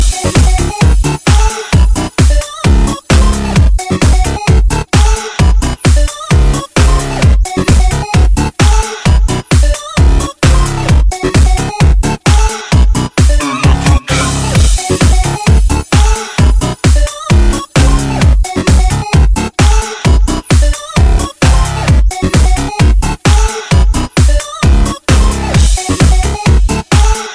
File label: Electronic Club